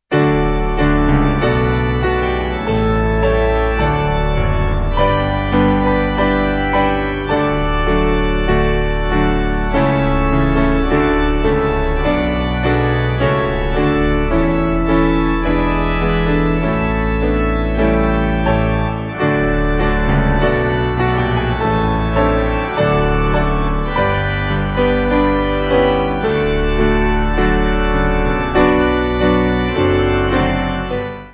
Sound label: Piano Organ